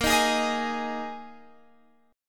A sharp 7th Suspended 4th A sharp; A#7sus4; strummed; x,13,13,13,16,16

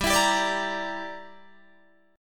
G Minor 6th, Guitar Open D

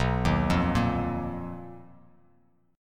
B Major 7th Flat 5th B Bass Standard; arpeggiated; 7,6,3,3; JGuitar

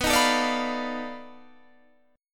B 7th Sharp 9th Flat 5th, down, Guitar, Standard; B7#9b5 strummed; JGuitar

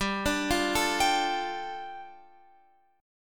G 7th Suspended 2nd G, Guitar Open F; G7sus2 arpeggiated

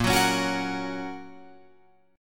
A sharp Minor Sharp 5th, down, Guitar, Open F; strummed; JGuitar

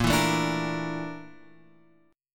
A sharp Major 7th Flat 5th, Guitar Open F; A#M7b5; strummed; JGuitar

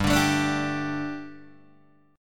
G Suspended 2nd G, down, Guitar, DADGAD

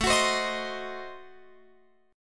A Diminished 7th, Guitar Open F; Adim7; strummed; chordshape

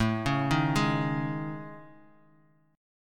A Major 7th Flat 5th, down Bass Standard; AM7b5 arpeggiated; JGuitar

